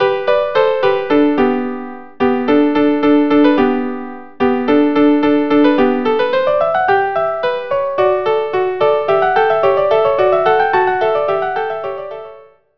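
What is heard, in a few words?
Allegro violin viola duo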